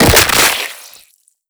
antlion leg dismember

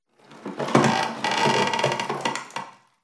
ambient sanctuary wood; alyx sanctuary wood

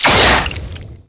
poison projectile

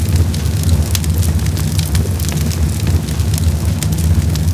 fire med loop; ambient

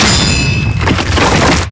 burst flavor, smokestack; destruction; Half Life Alyx

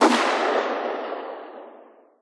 mp9 distant; weapons; Counter Strike Model Pack; CS2

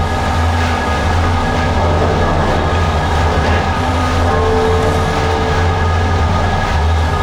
pipes active loop, machines; ambient; Counter Strike Model Pack; CS:GO

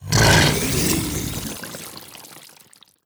puke, barnacle; Half Life Alyx

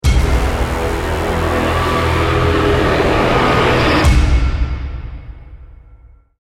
deathcam; CS2; Counter Strike

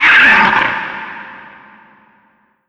vent screech, headcrab reviver, creatures

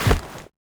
knife push attack heavy; weapons; Counter Strike Model Pack; CS:GO

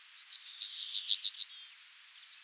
bird, ambient animal; Counter Strike Model Pack; CS:S

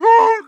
warning, zombie vox; creatures

